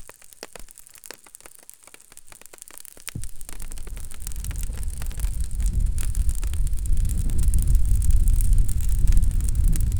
bubbles from a nearby cau

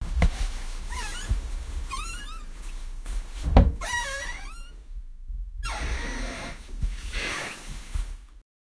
bed with squeaky sound

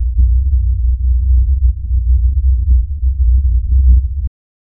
low frequency dark ambien, distortion

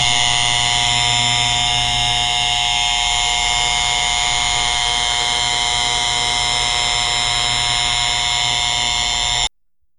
a neon sign buzzing

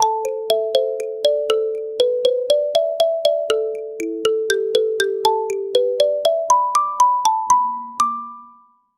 cartoon sparkle ping ping, marimba

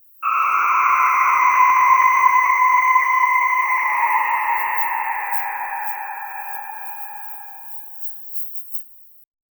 creepy echoing humming mi; evolving

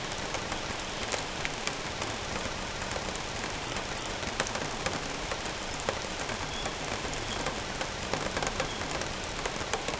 ethiopia nature and rain

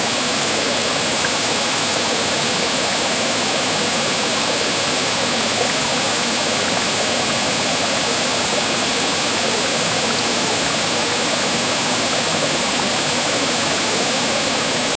wildlife sounds combined, tranquil

